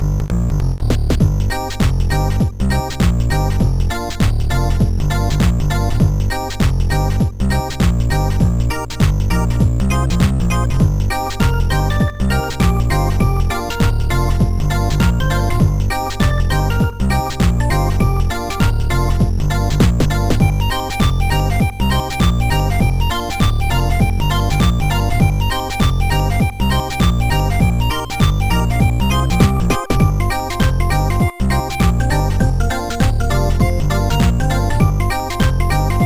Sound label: trembling chords; Trackers; Modland